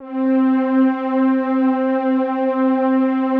PAD, DIGI-PADS; Best Service; XX Large Pads; Sample CDs

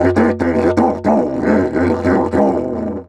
F#2 WILD, Didgeridoo; Big Fish Audio - Didgeridoo and Other Primitive Instruments

